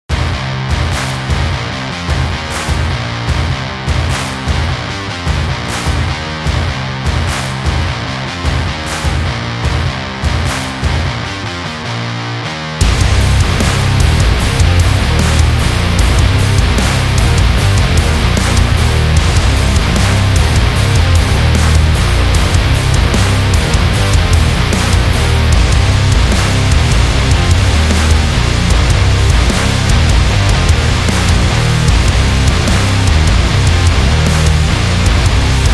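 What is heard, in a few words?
Truck, rock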